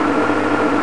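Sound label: tank engine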